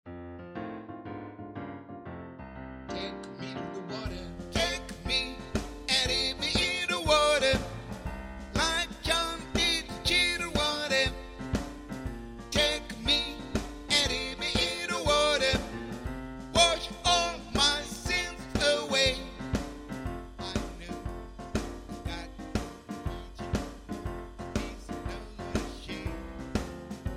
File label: Hommes